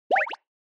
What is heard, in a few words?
Motion Eating Low